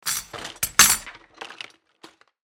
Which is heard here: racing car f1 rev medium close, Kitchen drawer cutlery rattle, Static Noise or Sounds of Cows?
Kitchen drawer cutlery rattle